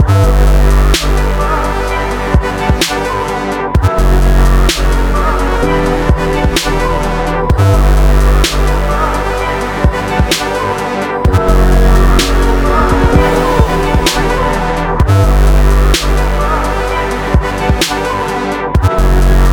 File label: Loop